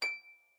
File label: Harp D7 f, Concert Harp; Chordophones; Composite Chordophones